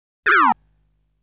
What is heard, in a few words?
Enemy's bullet fire sound; Legend of Hero Tonma